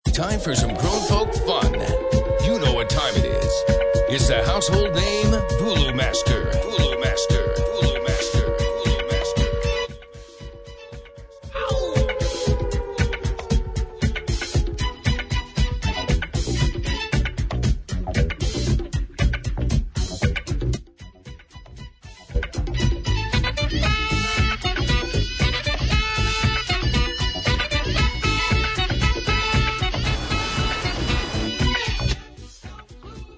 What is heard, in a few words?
Old School noise